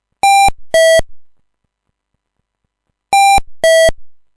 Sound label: 2tone chime